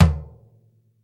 roomy tom drum one shot a sharp key, tuned to the A# note